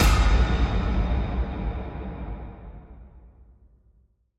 Horror Impact; SoundsCrate

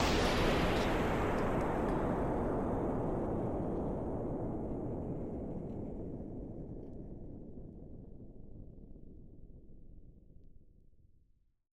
Wind Blast Explosion

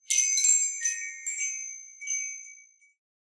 Short Wind Chimes; SoundsCrate

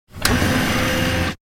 Robotic Movement; SoundsCrate

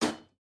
Metal Footfall; soundscrate

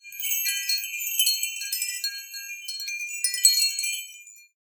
Wind Chimes E

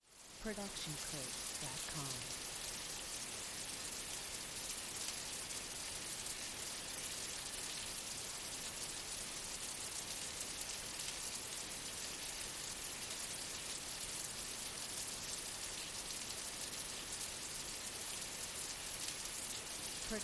Rain Pouring Outdoors; soundscrate

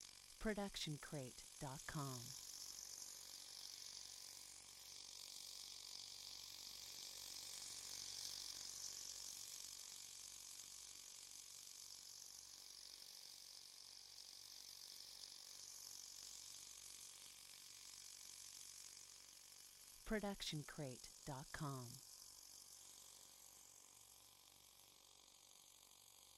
hovering drone; soundscrate

